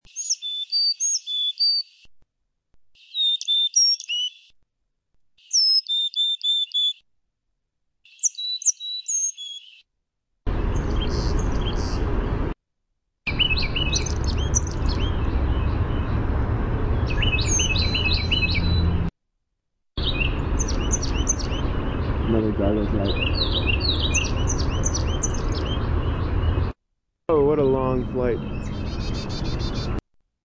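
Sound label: Carolina Chickadee chickadee gargle song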